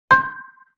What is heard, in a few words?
Xylophone Chord C; Vintage Cartoon; Musical Elements